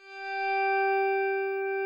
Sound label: NYCKELHARPA G 1; SoundBytes - Early Patches